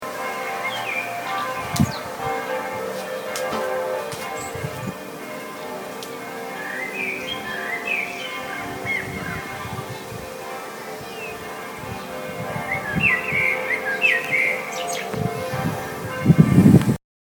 kirchenglocken

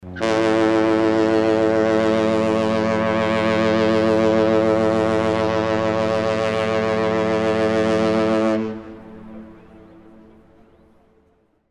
schiffshorn

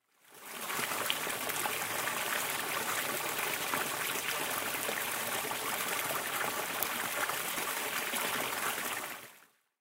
springbrunnen

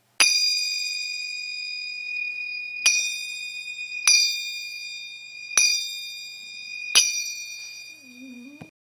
klingeling

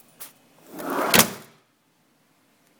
schublade

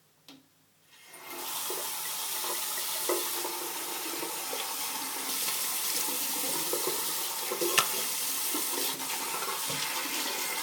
schnelle nassrasur

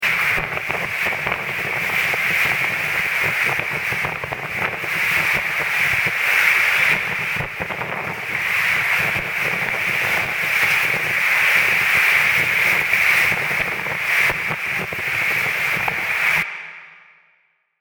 schaufelrad

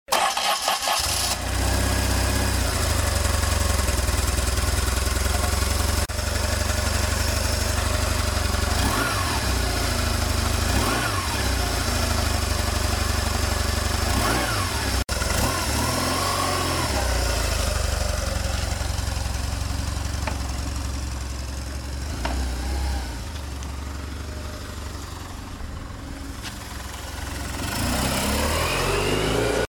motorad

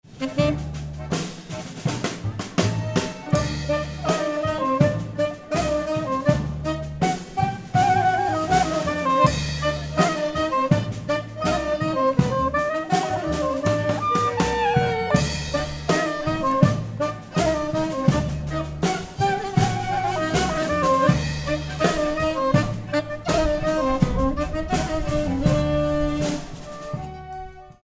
schwedenband in ottensen

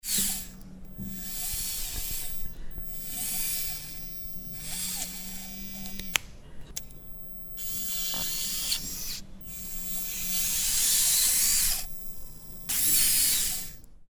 roboterhand

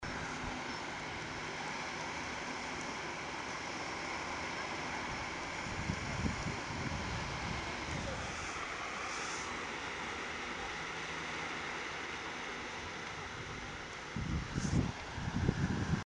elbe falkensteiner ufer

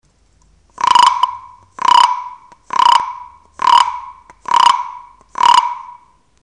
asiatischer Klangfrosch

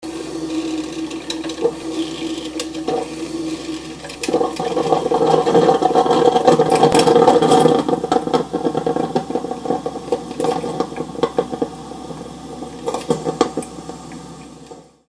kaffeemaschine

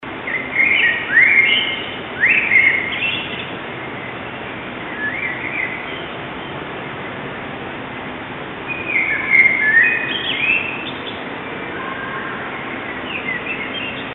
amsel am felde

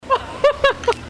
lachen

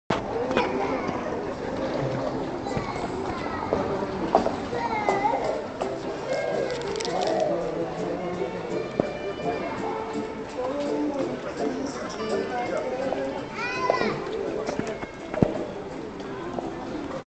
straensound bartelstrae

